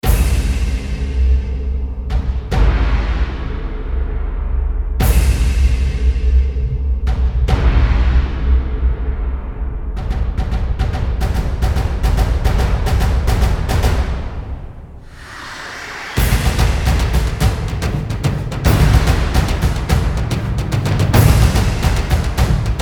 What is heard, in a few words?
Drums Only Version